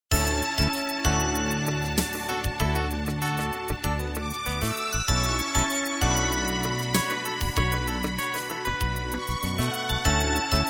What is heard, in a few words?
Easy Listening & Classical; Sound Ideas